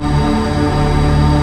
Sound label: BOILERROOM, Techno Trance; E-MU Formula 4000 Series Vol. 2